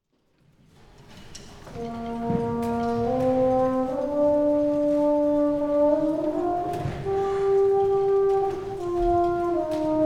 Horn

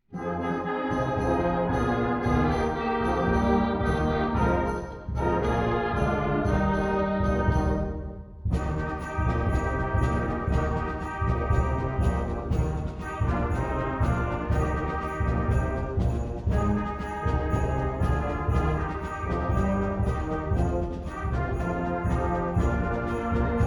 Tango Bells

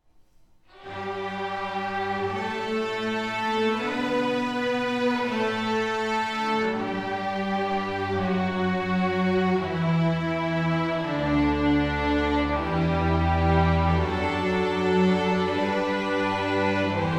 Chorale

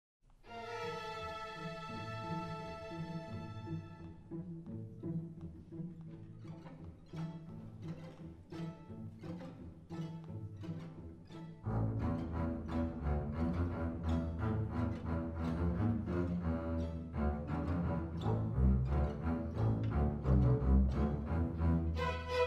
Oasis from Basses

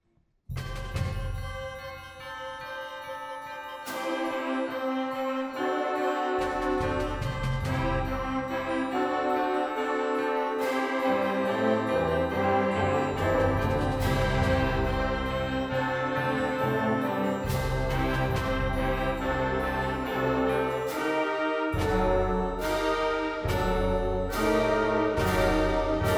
Fanfare and Fireworks

